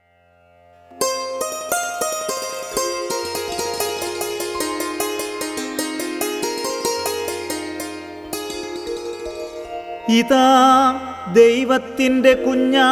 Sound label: Ragam; ordo cantus